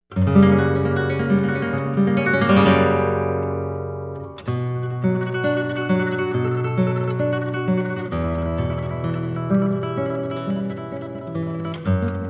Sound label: Intro for Solo Guitar